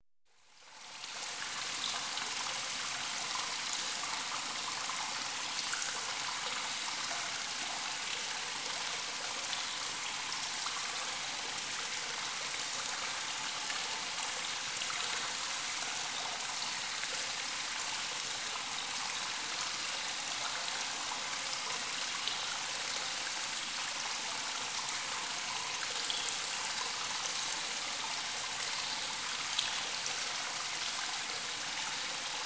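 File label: stream babbling in a cave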